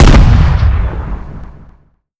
trigun shoot; tf2classic

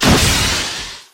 rocket shoot, weapons; tf2classic

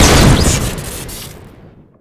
big gun shoot crit, weapons; tf2classic

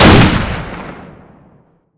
silver hand shoot, weapons; tf2classic

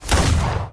hit world; weapons; tf2classic

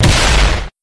hitflesh, weapons; tf2classic

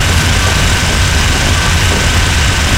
spin loop, weapons; tf2classic

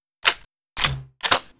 supershotgun worldreload; tf2classic

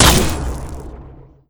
marked for death impact, samurai weapons; tf2classic

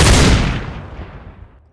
Wood Cutter Fire, weapons; tf2classic

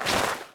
melee inspect movement, weapons; tf2classic

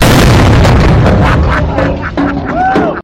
trash cannon fire; tf2classic

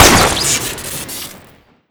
smg classic shoot crit; tf2classic

